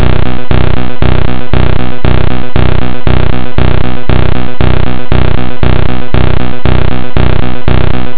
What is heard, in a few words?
drum loop